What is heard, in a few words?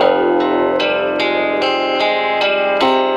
guitar, Sad